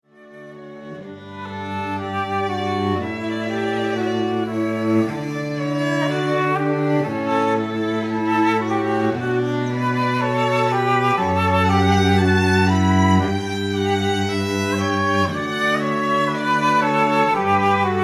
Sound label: Canon Flute Violin Cello